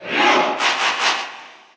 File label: Cheer Russian; Ryu; SSBU